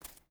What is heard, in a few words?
footstep barefoot concrete walk; Rust